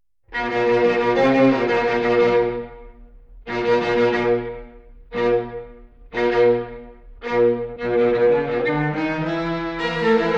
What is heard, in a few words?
Quartet B Flat Major, String Quartets; Béla Bartók